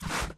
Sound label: dirt change